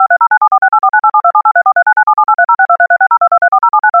Short Cow Moo DTMF Tones; KevanGC

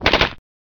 MP5 Firing

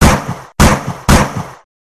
40 Cal Gun Shot X3

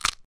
Leg Breaking

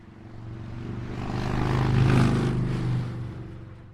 Motorcycle Pass By

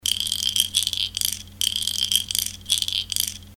Baby Rattle; Mike Koenig

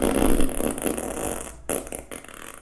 Long Fart

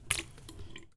Spit Splat

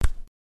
Pat

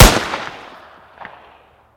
GUN FIRE; GoodSoundForYou

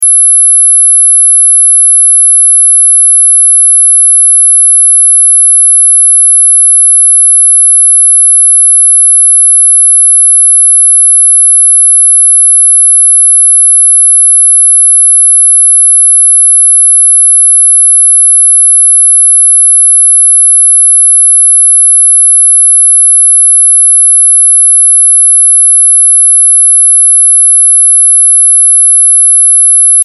Mosquito Ringtone; KevanGC

